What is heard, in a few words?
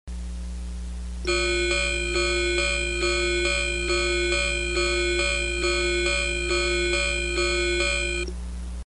bell; trainsound